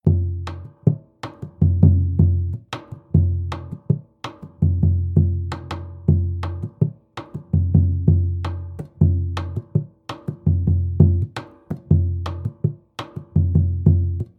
aluminium surdo big sound; percussion; loops

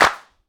hand clap g key; percussion; single hits one shots

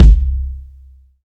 rich low end kick c key

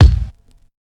big room bass drum sound a key; kick drum samples

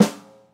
snare drum sample g sharp key; snare drum one shots

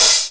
smooth open hi hat d sharp key; Duration 0:01; Channels Mono

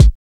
thumpy kick one shot A key; kick drum samples

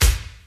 kick drum sample g sharp key

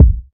raw kick sound a sharp key; kick drum samples

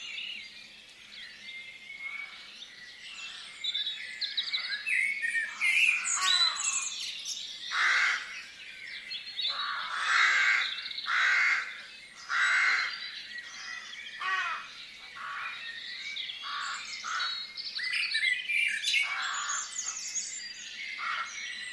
blackbirds and crows in farmland

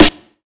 acoustic snare sound d sharp key; snare drum samples

